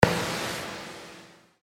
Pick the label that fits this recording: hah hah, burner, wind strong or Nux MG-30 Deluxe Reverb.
burner